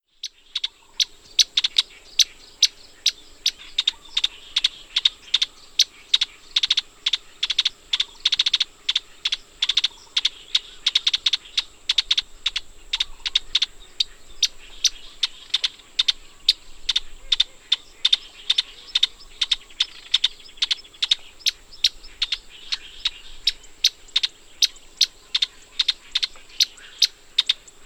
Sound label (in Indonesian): suara cicakoreng call